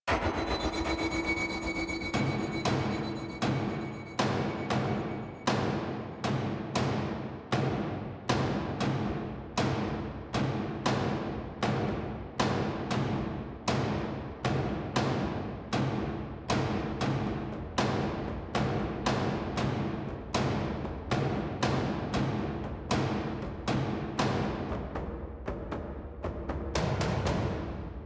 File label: Hvy Blades Elec Perc; Warframe; Dynamic Music; Grineer; Grineer One